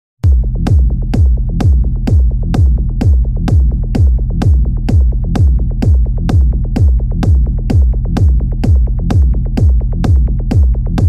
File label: techno